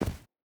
footstep hide snow jump land; Rust

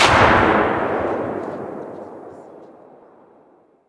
Inf Weapons; Red Orchestra 41-45

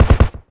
polycraft 3 shot far

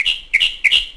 birds karelia; ambient; World of Tanks Blitz

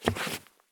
stop wood; Escape from Tarkov

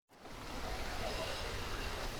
Aircrafts MiG-15bis Canopy Close; DCS

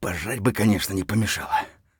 tired; Escape from Tarkov; scav1

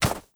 horse footstep snow hard; Rust

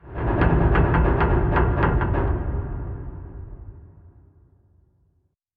submarines engines; World Of Warships

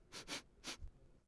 sniffs short; Civ4 warlords

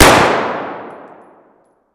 sks fire indoor distant; Escape from Tarkov

